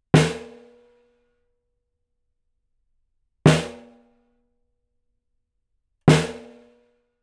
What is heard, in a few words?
Snare - R121 BiDirect; Edge modelled